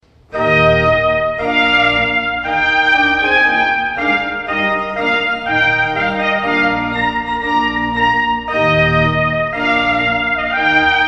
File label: trumpet voluntary; clarke; feiern